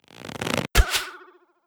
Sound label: Attack Scout